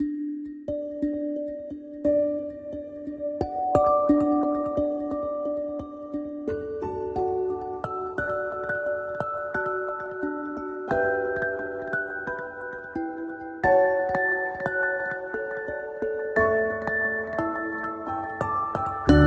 Music Box, alarms; Honor X8b; Go Beyond Music Box

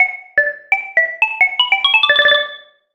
Ring The Alarm; Meitu; V4; alarms

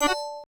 cam stop; LG

